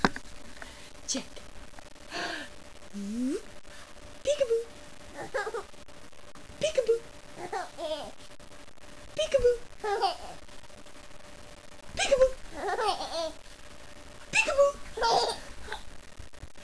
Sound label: peekaboo